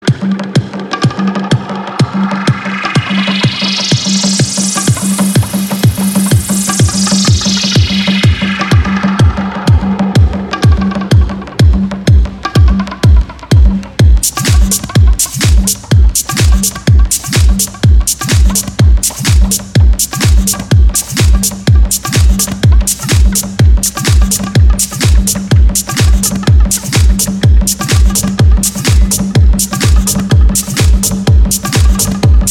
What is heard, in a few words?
House Jam